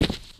human step

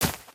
grass, human step

